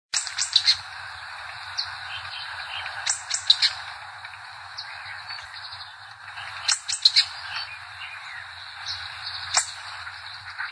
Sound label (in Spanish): voz pepitero de collar